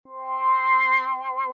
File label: tone